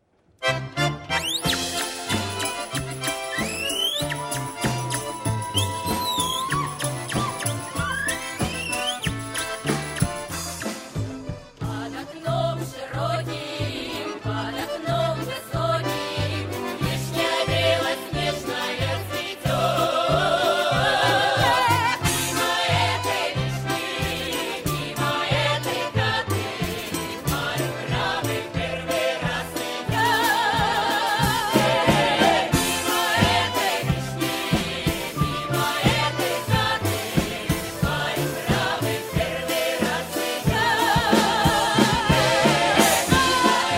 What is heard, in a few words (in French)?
russie tambov ensemble